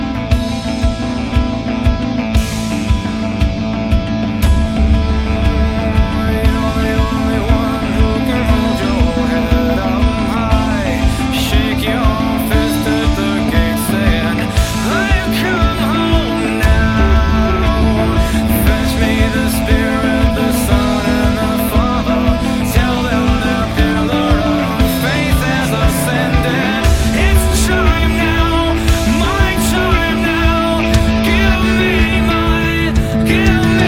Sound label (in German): Klingelton